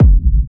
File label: Kick